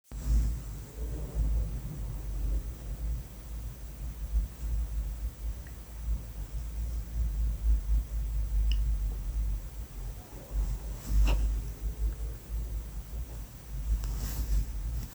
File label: Lick